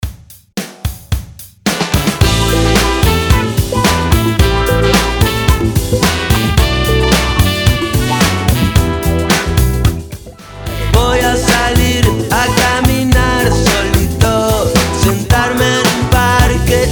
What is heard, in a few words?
intro outro 110 BPM